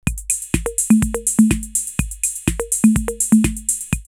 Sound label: 808 Congas Claves 124, drumloops, DrumMachines; X-Static Goldmine